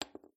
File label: chip put down table